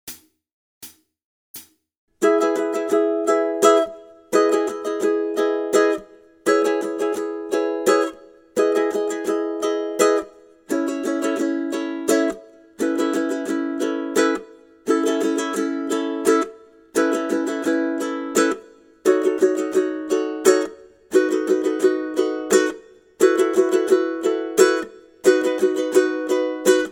rhythm study, ukulele; grade4

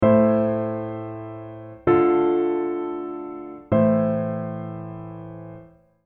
recognition of chords; Grade 1; pop vocals

